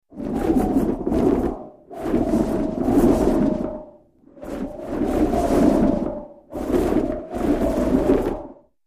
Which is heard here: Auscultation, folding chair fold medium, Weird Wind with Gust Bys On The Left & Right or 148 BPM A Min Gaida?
Weird Wind with Gust Bys On The Left & Right